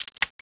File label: RELOAD; outlaws